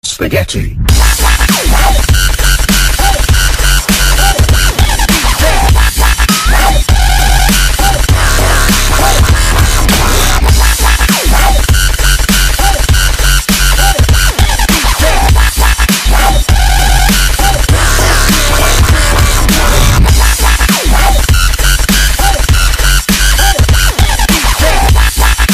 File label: Spaghetti Dubstep